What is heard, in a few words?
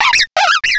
cry